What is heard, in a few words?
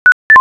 Voice error louder; Nokia; 9500; System sounds